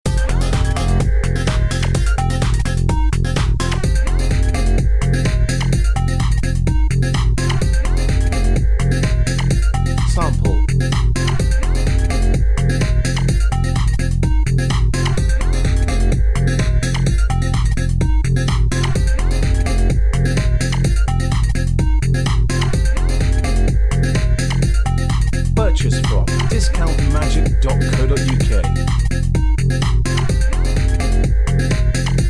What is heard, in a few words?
electro, backing